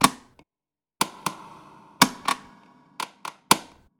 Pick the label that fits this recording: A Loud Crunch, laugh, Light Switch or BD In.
Light Switch